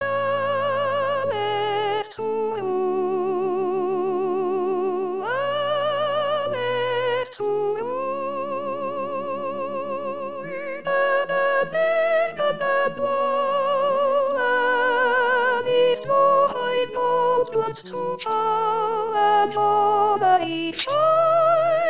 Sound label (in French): abendlied soprane; f.abt